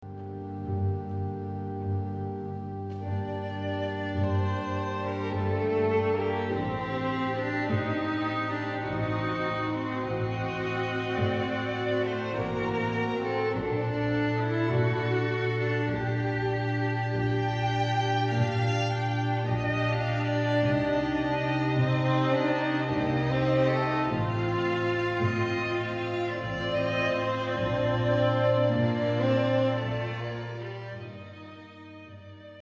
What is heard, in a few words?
Adagio for organ and strings